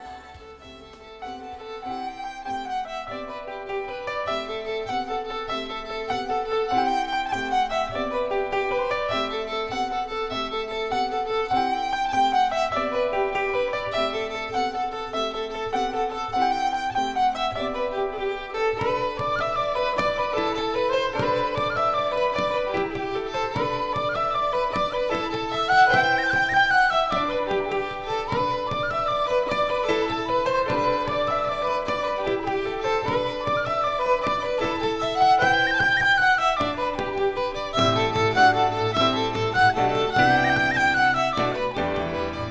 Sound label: Instrumental